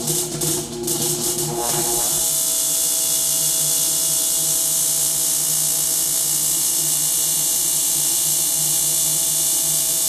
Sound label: ambient port; World Of Warships; port3d